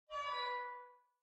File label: Clown Horn Far